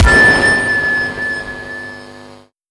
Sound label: distillery radio pop on; world misc